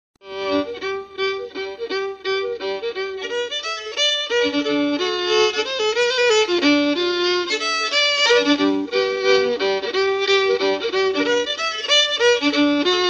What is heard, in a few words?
waltz g